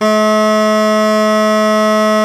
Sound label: BASS SHAWM; SoundBytes - Early Patches